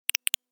checked off, message tone; notification sounds

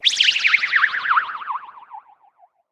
power down; message tones

